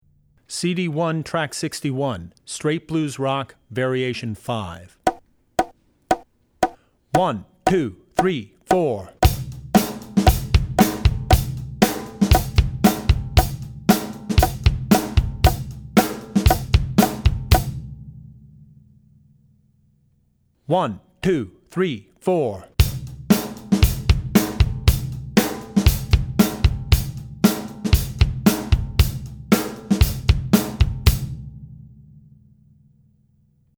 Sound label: Blues Rock; CD1 Track61 Blues Rock (Variation 5); Drummer's Bible Tracks